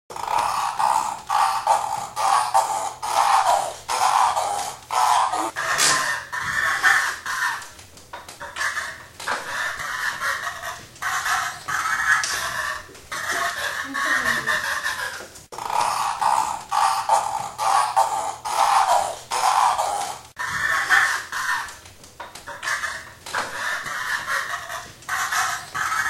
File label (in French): Grenouille